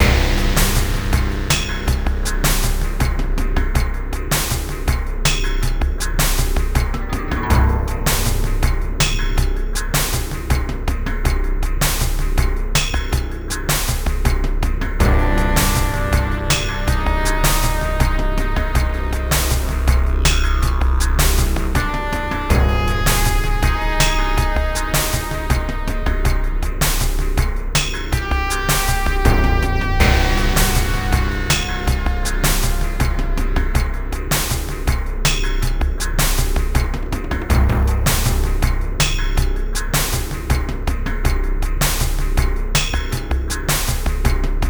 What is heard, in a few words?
Menu Alternate; Spelunky